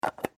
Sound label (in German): Tupperbox